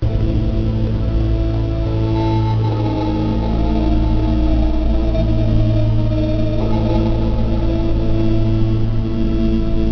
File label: background